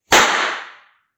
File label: Gunshot Top Of Staircase